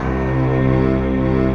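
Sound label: Japanese flute, Short Japanese Flute; Optical Media International; Sonic Images Library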